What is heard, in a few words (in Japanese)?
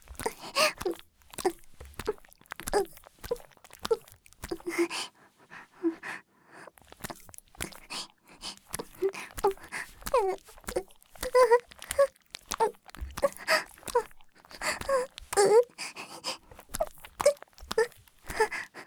ギシアンループ; あ～にき！いくら出す?